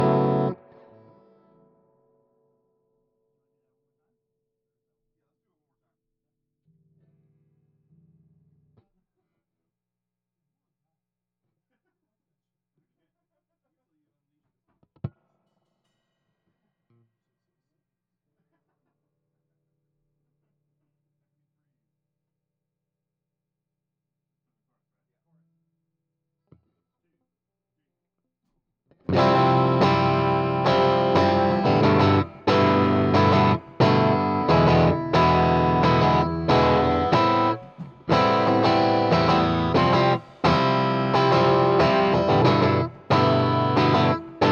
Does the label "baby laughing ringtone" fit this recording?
No